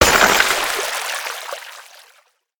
break; Apotheorasis

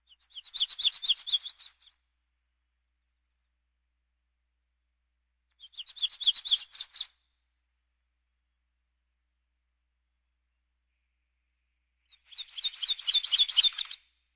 Birds

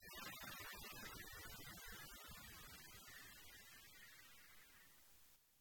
machine shutdown; Code7

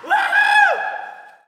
Hockey Male Woohoo; Hockey Vocal Sounds

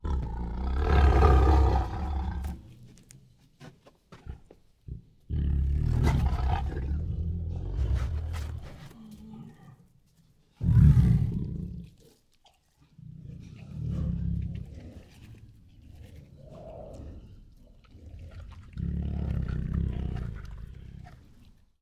Two Tigers Make Growls, Licks and Moves; Sound Ideas

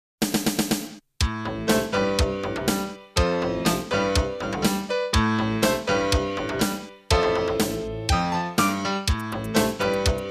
Jingles; 90 DDD Jingles

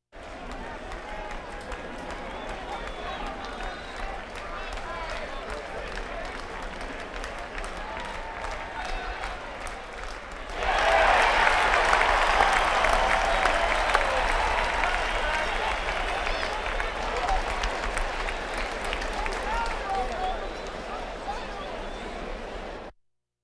Crowds Race cars Baseball; Valentino Production Sound Effects Library

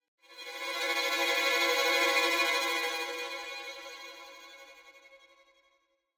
Symphonic Hit Strings Tremolo; Hollywood Edge; sound designer's toolkit v2

